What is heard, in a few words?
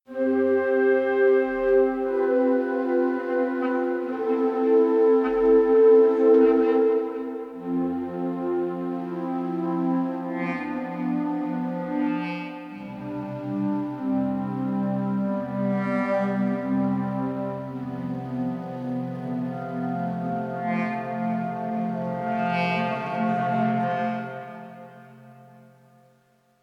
woodwinds; Spitfire Audio; Producer Portfolio; PP0025EVO GRID 4 – WOODWINDS